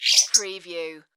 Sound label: Trill, Small Garden Bird Trill; animals; bird sounds